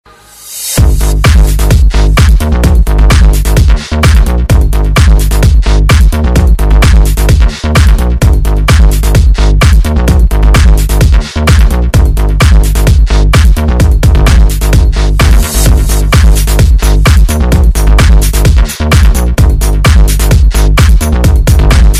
Noise Electro

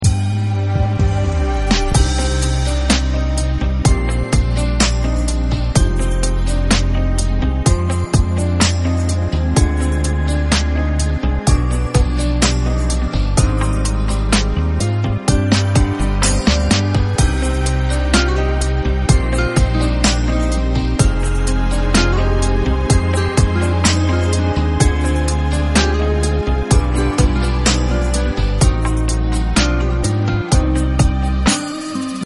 karaoke

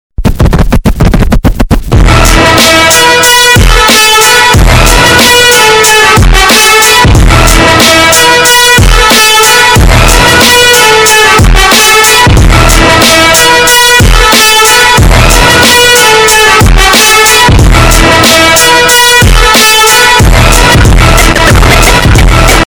Bass

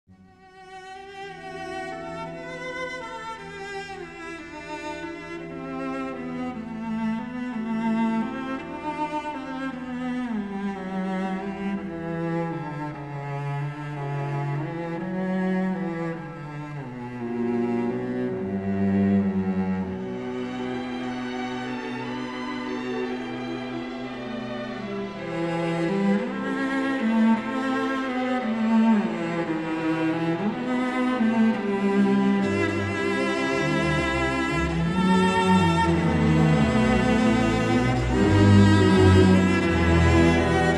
Cello Concerto In E Minor Opus 85; Elgar

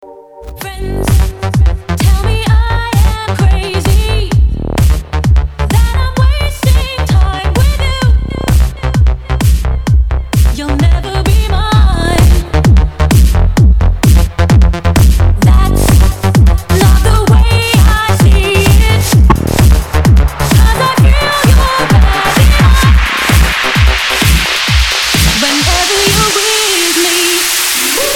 dj trombon

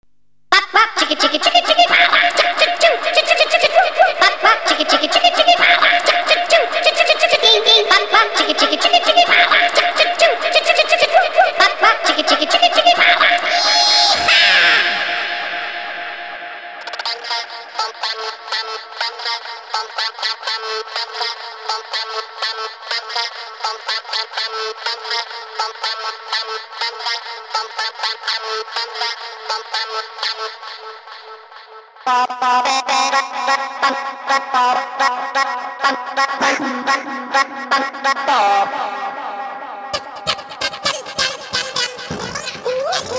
crazy sounds (acapella)

